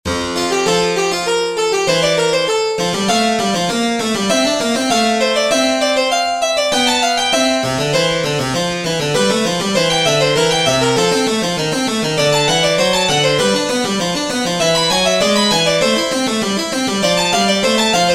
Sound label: Partimento; F. Fenarolli